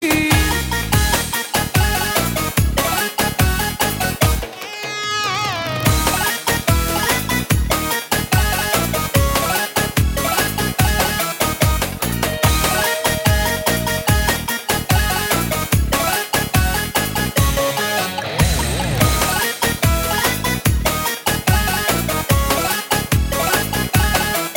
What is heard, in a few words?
Balkanic